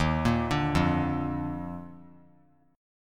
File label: D sharp Major 7th Suspended 2nd; arpeggiated; JGuitar